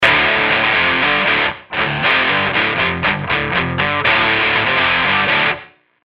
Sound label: guitar